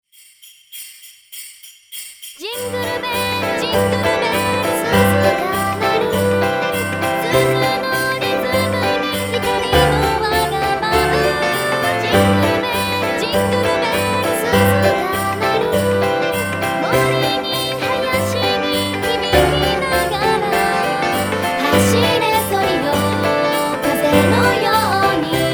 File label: jingle bell; ジングルベル